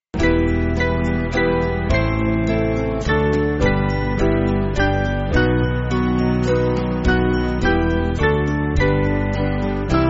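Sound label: Music Backing Files for Churches; Strong Son Of God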